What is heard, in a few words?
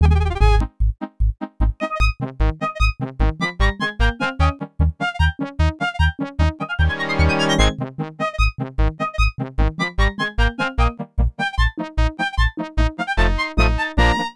Tritsch-Tratsch Polka; Asterix and the Power of the Gods; genesis